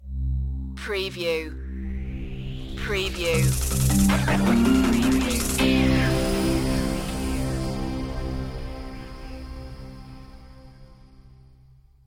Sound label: idents; boiler room